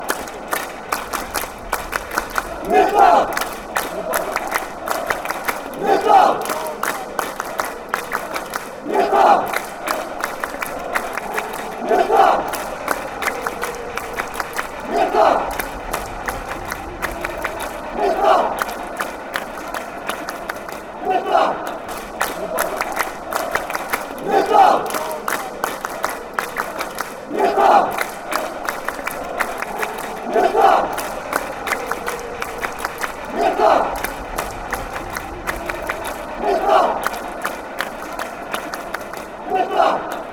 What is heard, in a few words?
metal fanchants